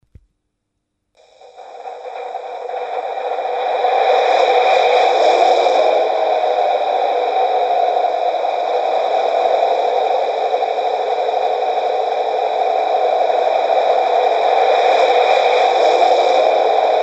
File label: Napier Delta Diesel